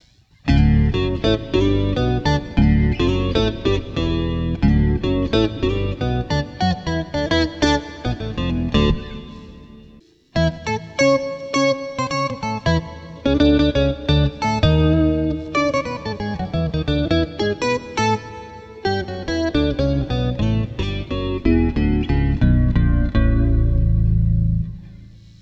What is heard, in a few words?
Guitar Octave, AXE FX 3